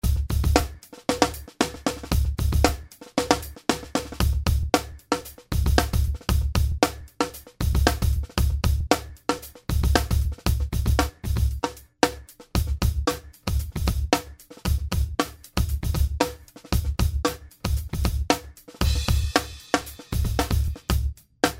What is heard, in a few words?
DRUMS ONLY; BR1600CD Drum Loops